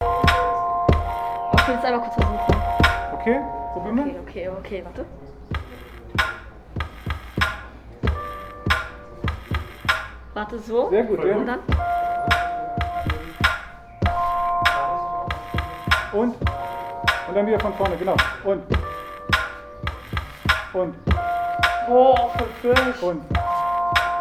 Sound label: Beat Box; Firmprojekt; Kurs